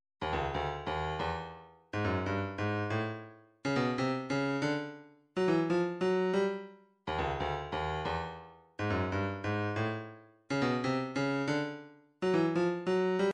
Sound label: snare